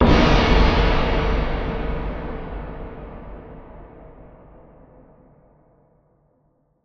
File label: Impact Boom Crash; bottlerocket